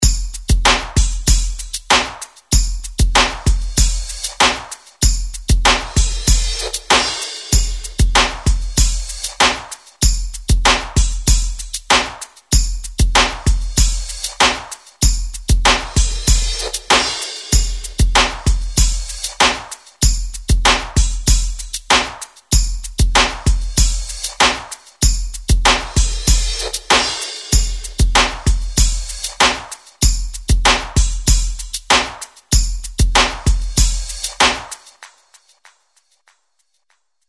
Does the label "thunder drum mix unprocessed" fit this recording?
Yes